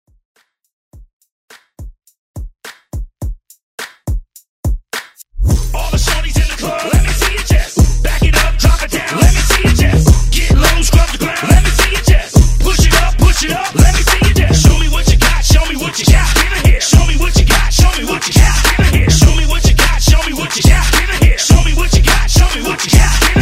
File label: Hype